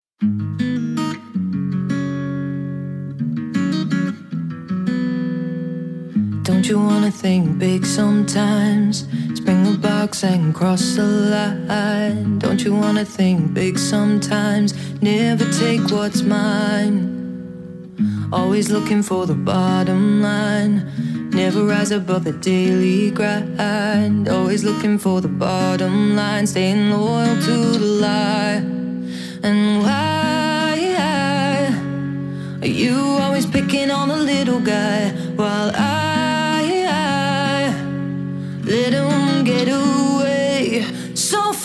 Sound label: Acoustic